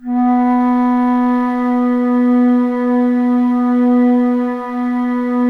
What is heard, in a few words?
ALTO B2, ALTO FLUTE; USB Soundscan vol.23